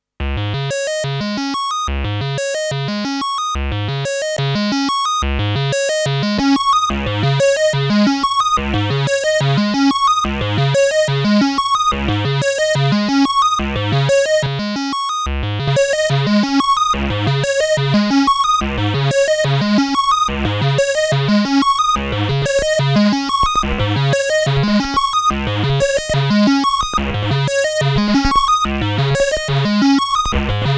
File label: Juno60 Stereo Arpeggio; June60